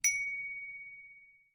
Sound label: chime tree